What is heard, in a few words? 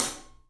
Studio Hats, 3DPercussives